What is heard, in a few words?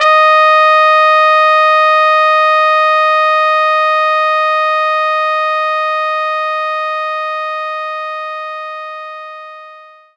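trumpet D#5; knights try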